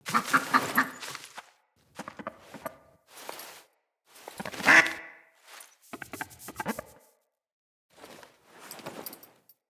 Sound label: Duck; Encounters; Animal Rescue